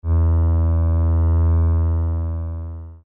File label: cello c2